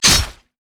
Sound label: basicattack; pantheon